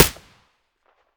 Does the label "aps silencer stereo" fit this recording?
Yes